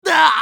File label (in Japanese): 被ダメージ; コインブラトゥルーパー